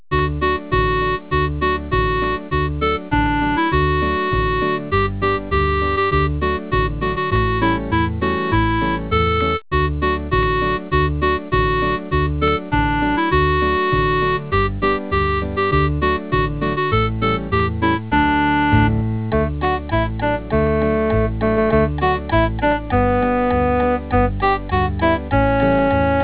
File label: jingle bells